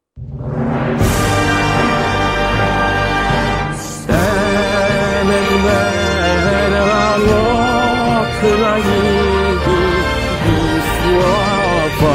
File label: Ukrainian Anthem AI Cover; Putin